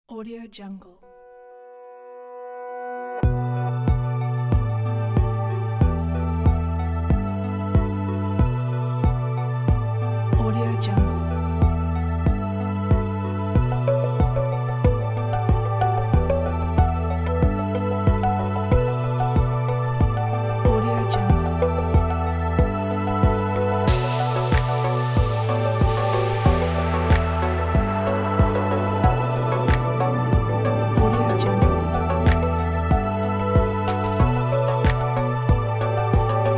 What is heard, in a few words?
ambient atmosphere; bdksonicmusic